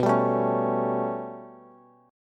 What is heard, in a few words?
B 7th Suspended 2nd Sharp 5th, down, Bass, Bass Standard; B7sus2#5 strummed; chord shape; JGuitar